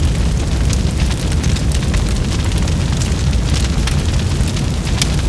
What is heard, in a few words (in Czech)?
molotov ohen; Mafie 1